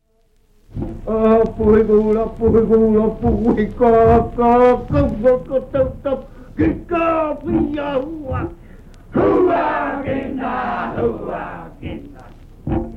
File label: maori; sachs